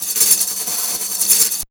DRM SN; USB Soundscan vol.03; AKAI

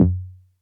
Stabs, Elektron MachineDrum; SPS1 MKII

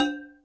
Mid Ethnic, Alesis D4 drum machine

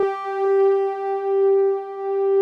PAD SOFTS; Pro-Rec - Synthsonic

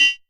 CR78 Beat

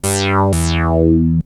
Korg Prophecy hits, arps and fx; FM 57 - The Ultimate Sample Collection (1997)

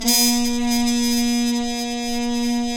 BRS TRUMPETS, Roland LA

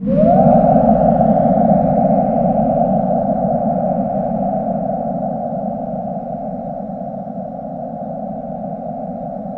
SEA WHISTL; Spectrasonic Distorted Reality 1; SEA WHISTLE

